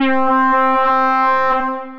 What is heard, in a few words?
Warbletone, broken keys; Instruments; Ableton; Factory Packs; Mood Reel